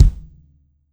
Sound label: boom kick one shot d key